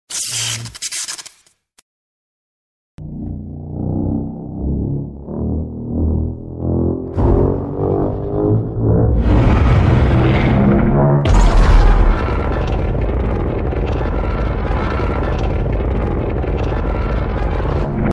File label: electro breath